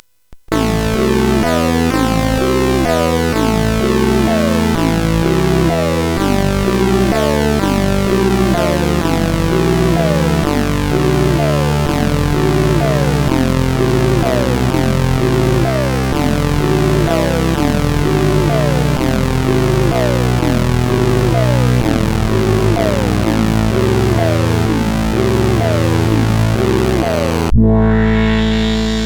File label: Zap, CSG8580R5; hvsc